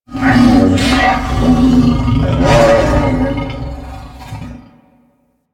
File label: Wolf Pet; Animals; Warframe; Quests; Duviri